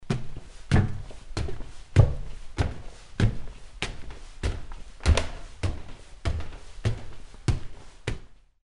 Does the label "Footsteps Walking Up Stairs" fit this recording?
Yes